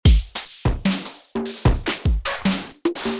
Drums; Emergent

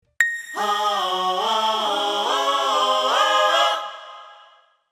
orchestration

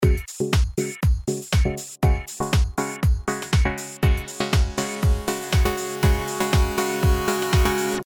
synth backing length

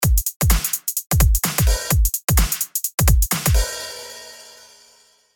swing 0

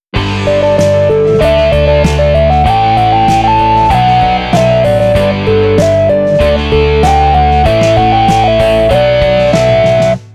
Power

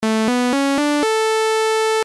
sawtooth

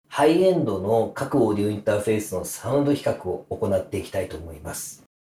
low level after

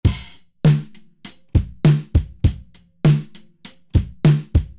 Drum; UAD

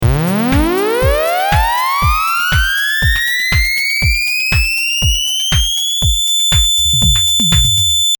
up pitch

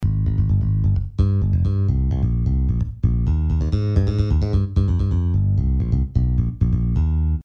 Bass

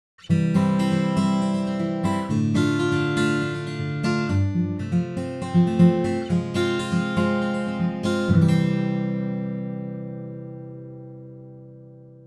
Chord; Ample

